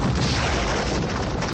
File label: explosion; explosion 1